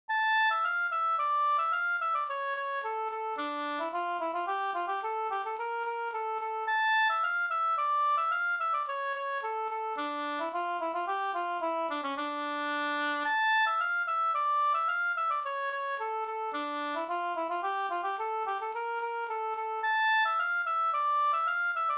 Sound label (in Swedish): polska Nackpolska